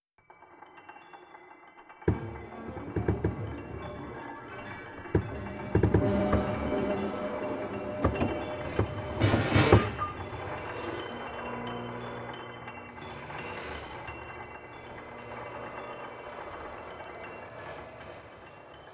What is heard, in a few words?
piano waves